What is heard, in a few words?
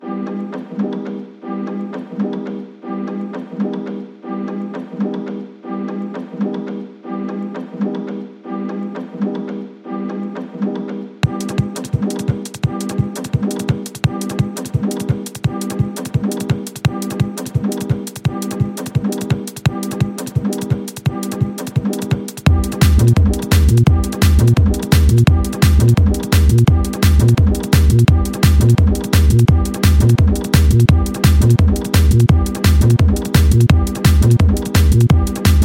Phonk (Speed Up Remix)